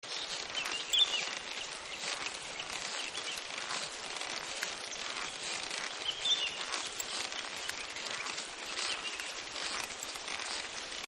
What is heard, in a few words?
Riding A Camel; Riding A Camel 3; Camel Sound Effects; Animals